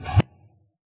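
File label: Reverse bass drum, Yamaha DJX; 155 DJX Kit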